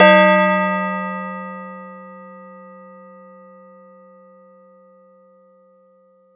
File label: Yamaha TX802 Synth Bell C6